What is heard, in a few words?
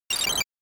NES RPG Magic Ice